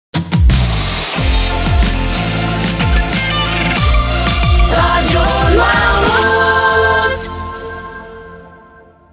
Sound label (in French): Jingle Chante Soft Pop; RADIO NOIRMOUT